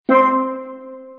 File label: C Drum, Casio